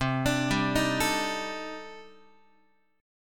C Minor 6th Add 9th C Guitar; Cm6add9 arpeggiated; JGuitar

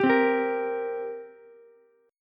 G Minor Double Flat 5th, down, Ukulele; strummed; JGuitar

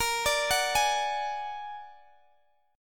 A sharp Augmented 7th, Guitar Open D

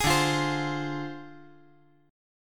D Minor 7th Flat 5th

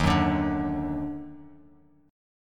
Eb Minor Major 7th Eb down Bass Standard, chord; EbmM7; strummed; JGuitar

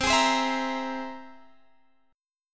C Augmented 7th, Guitar, Half Step Down

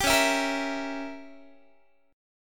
Db Diminished 7th Db down Guitar; strummed

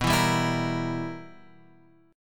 B Major 7th Suspended 4th Sharp 5th, chord; BM7sus4#5 Chord {7 7 5 X 5 6} strummed

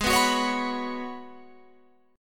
G sharp Minor 11th G sharp Guitar Open F, chord; G#m11 strummed; 20,18,18,20,21,18

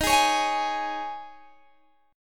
D sharp 7th Suspended 4th D sharp, Guitar Open G

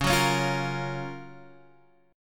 F sharp Major 7th

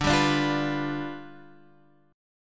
G 7th D

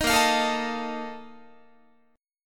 A sharp Major 7th Suspended 4th, Guitar; strummed

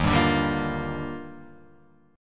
Eb Major 9th; strummed; EbM9

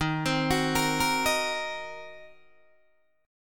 Eb Minor 6th, Guitar DADGAD; Ebm6 arpeggiated

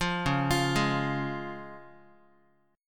Db Major Db Guitar; arpeggiated

